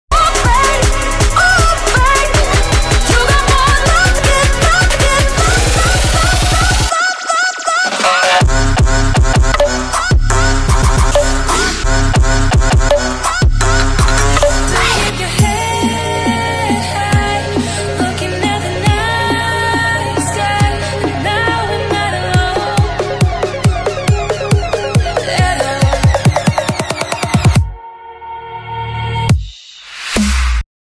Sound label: beat drop